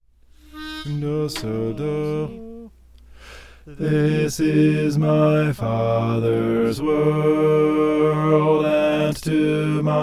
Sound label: this is my fathers bass